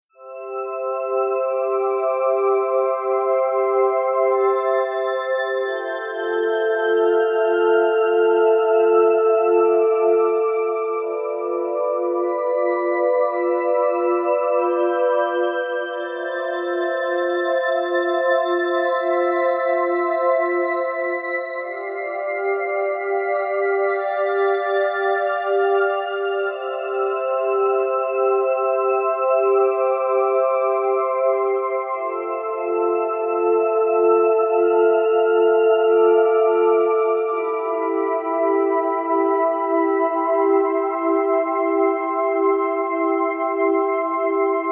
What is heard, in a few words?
Pad Impression